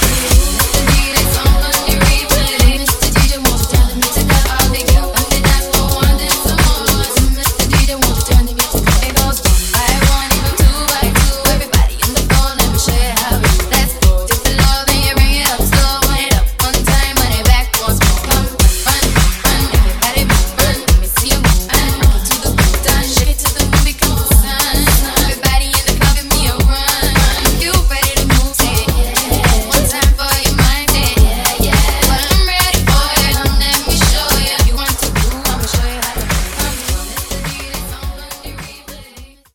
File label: 105bpm Fm